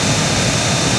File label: saw rotating